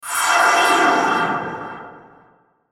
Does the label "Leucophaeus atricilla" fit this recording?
No